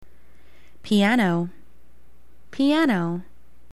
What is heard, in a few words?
piano